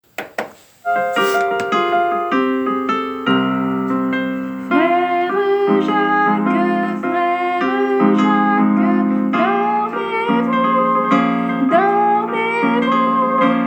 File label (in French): frere Jacques en blues mineur; 2 avril journée internationale de l'autisme